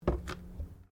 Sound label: Bottle Plastic Water Place Bench